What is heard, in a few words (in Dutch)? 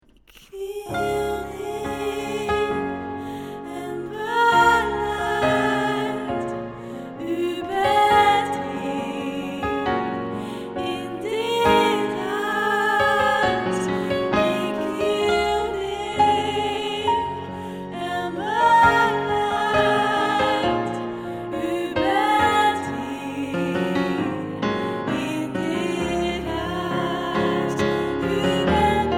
Ik Knie Neer Sop, vocale partijen choir; Presence op het Malieveld